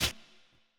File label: Slice; Soundeffects; Slice Game